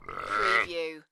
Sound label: Animal Sheep Adult